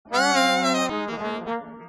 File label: horns cut; Analysis of saxaphone, trombone, and (very quiet) guitar trio; Time Cutting